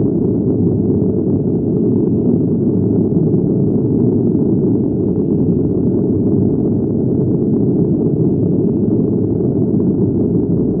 zoom ambient star fusion; Stellaris

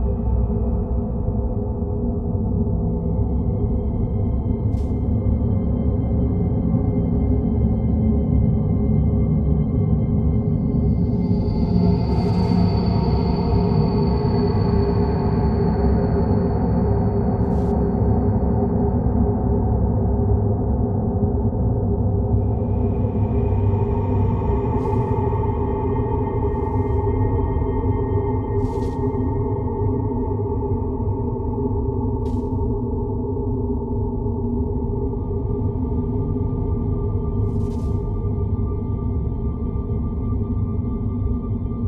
amb celestial storm; Stellaris; cosmic storms

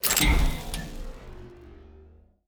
ui holograph unlocked; Stellaris; paragon

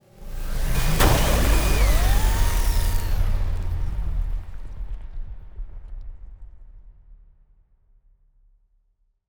ship transport ship move; Stellaris; toxoids; ships

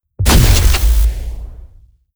dragon wing attack; Stellaris; guardians

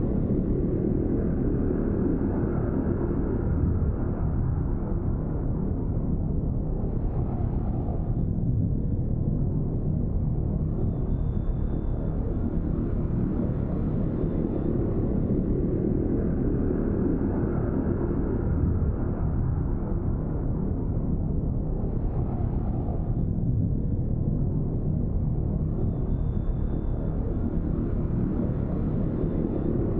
ship habitat idle; Stellaris; machineage

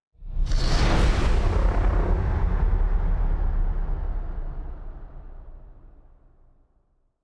ship colossus move; ships; Stellaris; toxoids

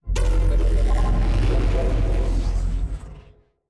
psionic robot; Stellaris; shroud

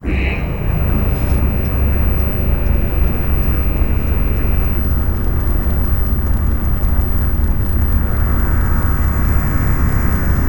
sfx colossus closing; Stellaris; nemesis

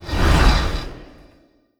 sfx construction ship moving out; Stellaris; nemesis

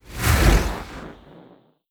sfx transport ship moving out; Stellaris; nemesis

